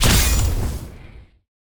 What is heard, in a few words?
enemy attack penetrate hit; bloom minigame